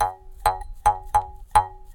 jaw harp recorded live, in a small apartment studio